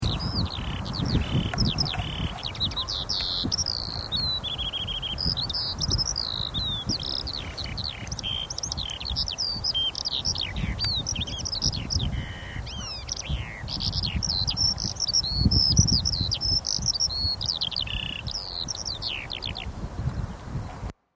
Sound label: skylark